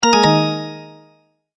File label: multimedia tone end